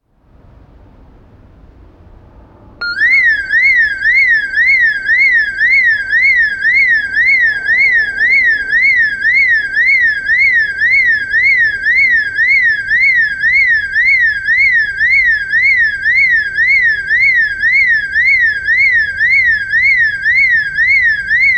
Sirène alarme de banque

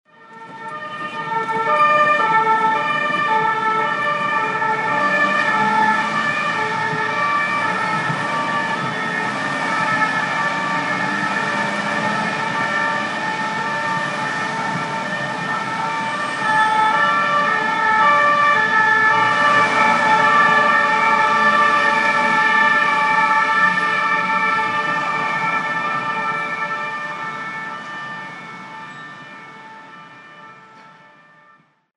Police ds les rues; bruitage

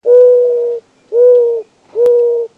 Tourterelle; bruitage

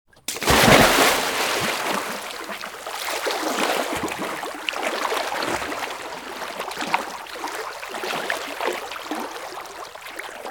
Plongeon; bruitage; Liquides

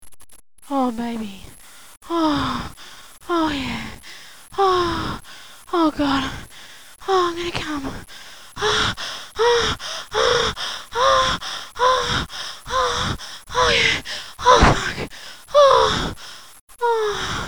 Orgasme femme

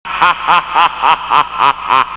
Ah ah ah diabolique; bruitage

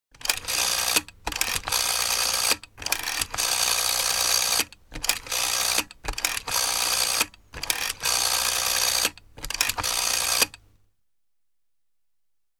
Vieux cadran telephone

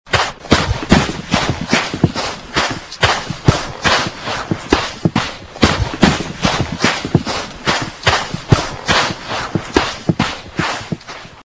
Courses sable, bruitage Sports

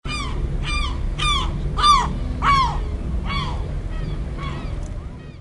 Mouette, Animaux; bruitage

